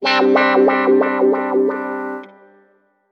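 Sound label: guitar, wah-wah, disco; Best Service ProSamples vol.48 - Disco Fever; AKAI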